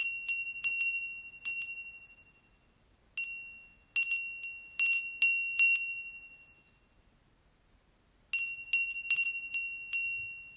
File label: windbell